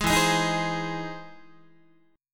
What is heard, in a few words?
E Augmented Major 7th E down Guitar; E+M7 strummed; JGuitar